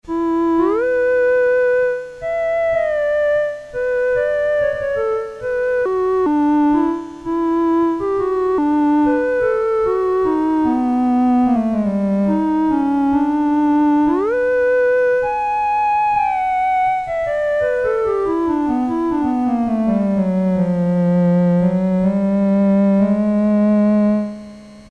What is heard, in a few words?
clarinet, Casio SK-1, Harmonic component sound examples